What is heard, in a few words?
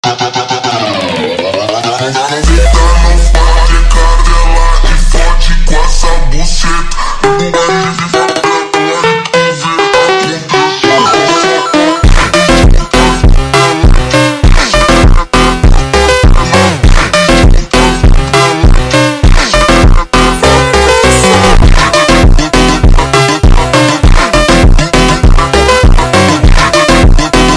Phonk, Super Slowed